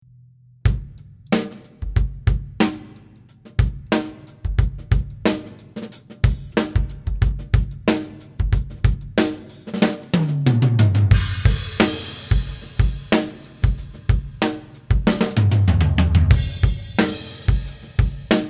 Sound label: test drums